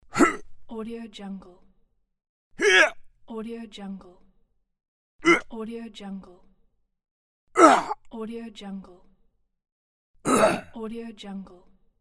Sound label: male struggle grunt